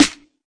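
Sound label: classic hip hop snare; Classic Hip Hop Mega Kit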